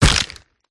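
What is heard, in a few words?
wyvern attack contact middle; viverna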